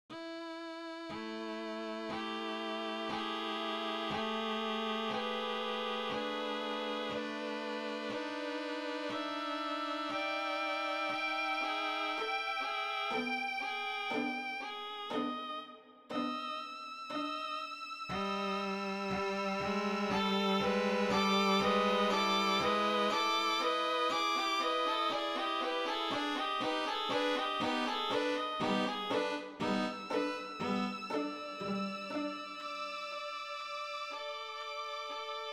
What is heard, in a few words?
Canonic Quartet